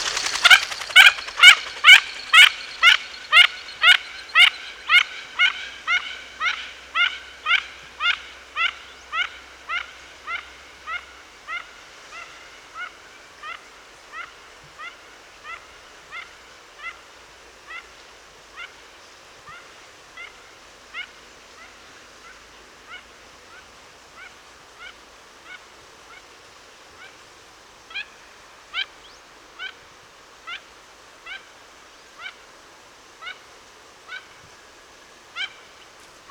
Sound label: Mandarin duck; اردک ماندارین